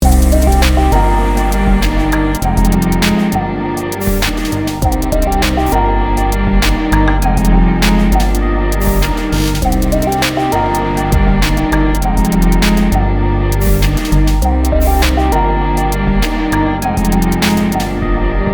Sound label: field journey